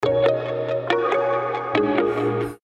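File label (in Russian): tri akkorda, three chords; soobshcheniia sms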